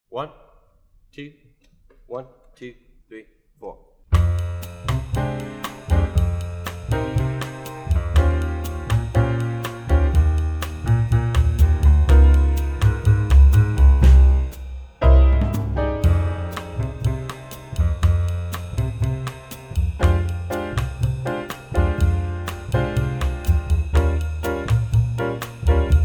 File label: latin guitar muted